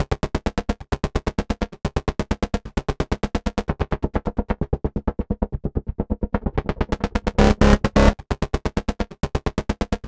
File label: Click Bass, EDM; Sonic Foundry (Sony Creative Software); Afterhours EDM; Ibiza Underground